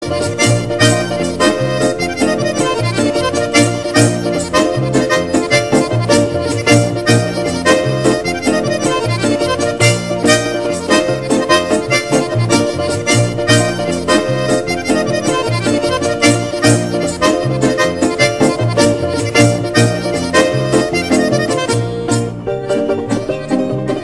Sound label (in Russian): garmon; zvuki k osennim prazdnikam; zvukovoye soprovozhdeniye